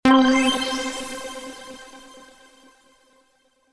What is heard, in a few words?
Power Up